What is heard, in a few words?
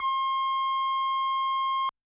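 synth brass; Yamaha DTXpress-II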